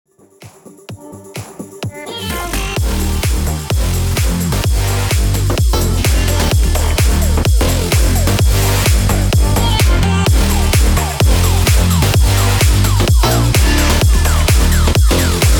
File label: MASHUP 128 BPM